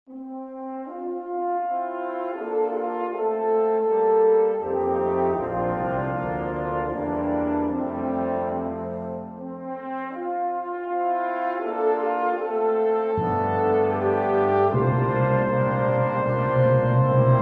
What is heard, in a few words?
brass choir